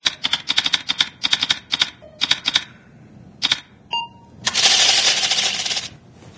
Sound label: key hits; Source engine